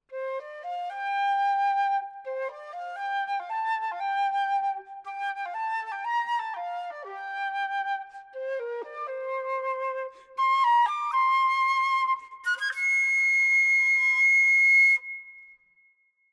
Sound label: Iris Theme Happy Flute; three sisters